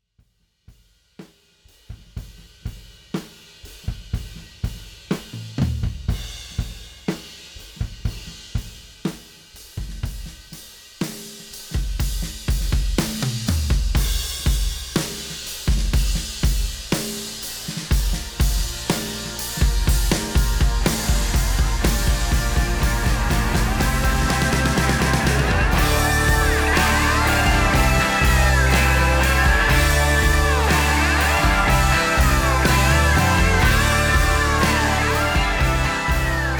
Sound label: Washy heavy groove, drum